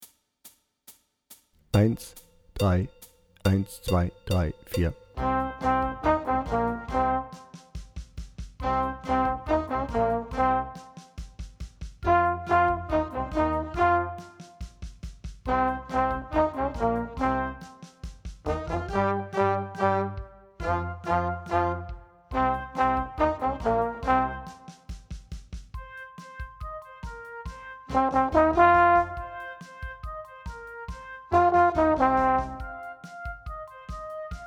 Hard Rock Blues Horn lauter

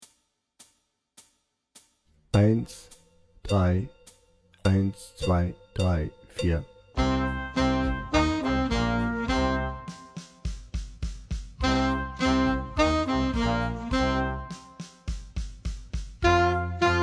Hard Rock Blues Altsaxophon lauter langsamer

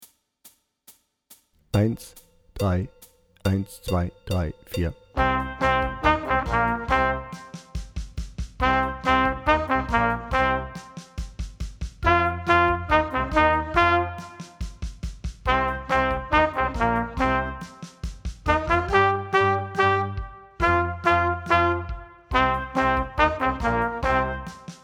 Hard Rock Blues Trompete; Trompete lauter

